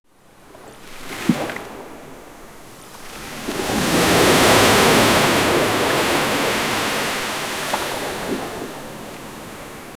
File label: ocean waves crashing on, shore